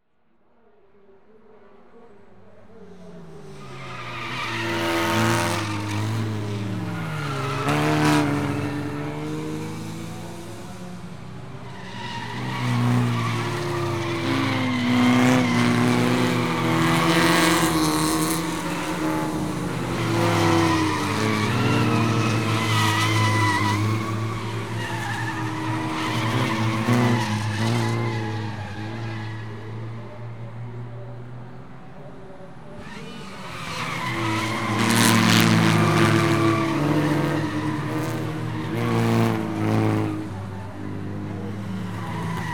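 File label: Motor Racing Saloon Cars Cornering; Sport Leisure; BBC Sound Effects Library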